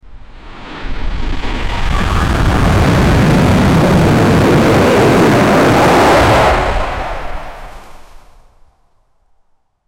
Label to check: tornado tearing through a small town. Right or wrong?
Right